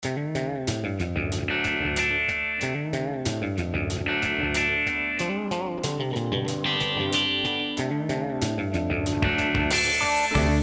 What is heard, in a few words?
play the base zonder zang